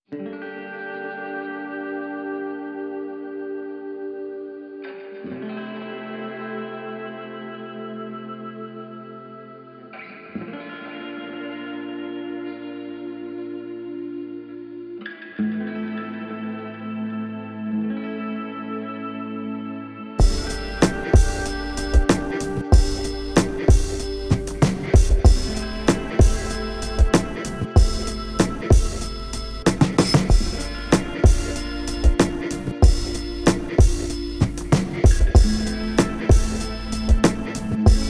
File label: Didg